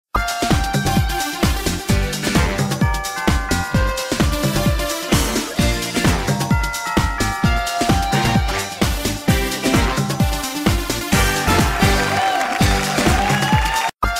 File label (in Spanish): Sintonia Completa; Pasapalabra